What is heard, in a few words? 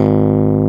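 electric piano G#0; AKAI S-Series CD-ROM Sound Library VOL-8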